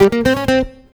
guitar, stringed instruments

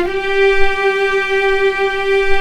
G4, The Ultimate String Collection

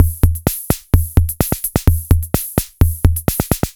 Analog 128 bpm, retro drum machine samples, Loops; SR88

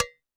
TD-20 Tom A, Roland TD-20 Toms

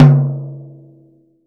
J T 2 FF, TOM JAZ

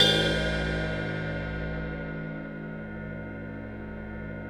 PING ONG, Drums; Distored Reality 1&2

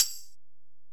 Ac Perc, Drum Machines Alesis DM-PRO

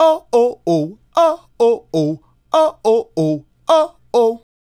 Male Solo, Hip-Hop and RnB Vocals

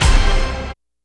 DANCE STAB; E-MU Formula 4000 Series Vol. 2; Dance Hits

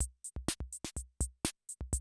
CR78 125, drum loops

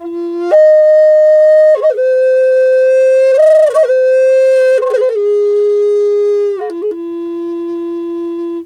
F wild flute; Big Fish Audio - Didgeridoo and Other Primitive Instruments

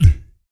VOC PERC, Vocal Planet Jazz FX BASS PERC

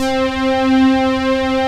SATURN POLYS, synths; Pro-Rec - Synthsonic

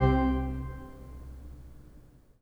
PIPE, 3DOrgans

